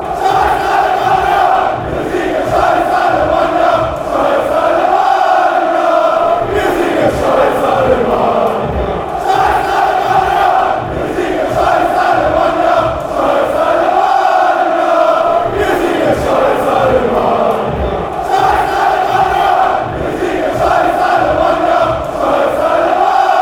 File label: alemania, fan chants